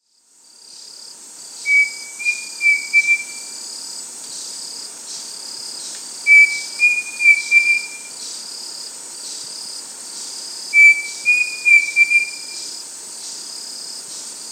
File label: Pavonine Cuckoo (Dromococcyx pavoninus), Peru; S. America